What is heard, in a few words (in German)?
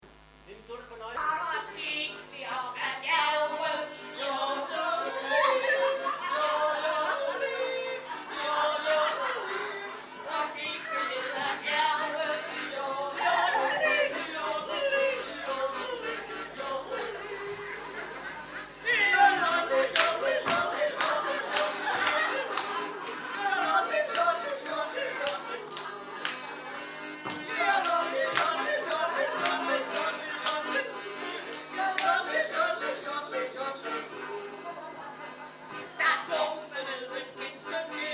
Jodlen